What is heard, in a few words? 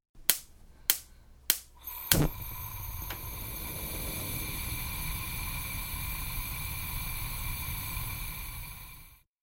Lighting a gas stove from electric spark; Gas Hob; Kitchen; Household